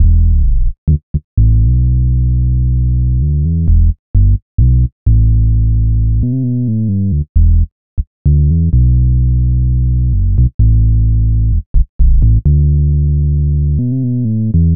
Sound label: 130 Smooth Bass